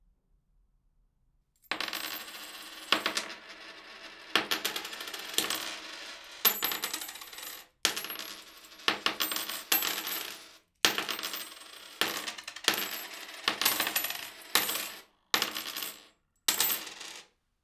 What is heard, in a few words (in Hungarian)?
asztalra apró dobálása, pénz; angyalfold